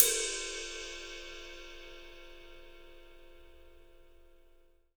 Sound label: ride cymbal; 3D Sound; 3D Drums